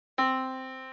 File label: yamaha Disk